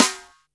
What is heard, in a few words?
Boss DR-660 Snare, Drum Machines